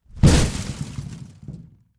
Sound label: Big Grenade Explosion; بمب و نارنجک